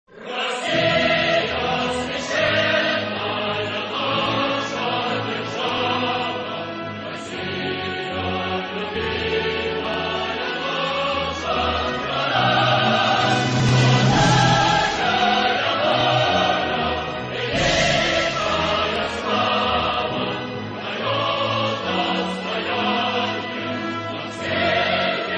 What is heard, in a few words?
rossiya svyashchennaya nasha derzhava russia national anthem russian; ringtone